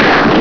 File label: SHOT